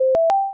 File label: chime